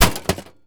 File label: damage; ludumdare40